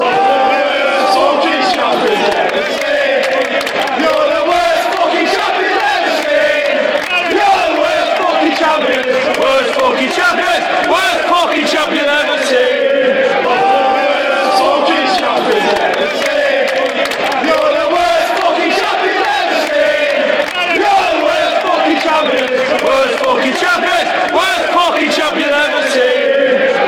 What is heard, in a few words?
worst champions ever seen, chants